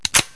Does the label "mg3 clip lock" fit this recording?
Yes